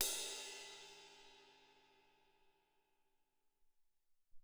Amb Ride, Alesis SR-18

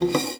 GUITAR FX; Xsample VOL-2

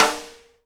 snare RIM RING, Live Snares

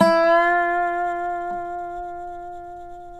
SLIDE, Dobro Slides

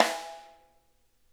rim hi L, snare; Sonic Reality; Interactive Drum Kits

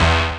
DR. LOOP, BUZZ; X-Static Goldmine 3

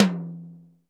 DRY, R8 DRUMS; Crypton - DrumBase5000

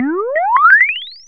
BLEEPS UP; Zero-G Ambient 1

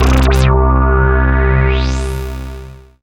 syn c, Dance Synths; ProSamples vol.37; Juno Jam; Best Service

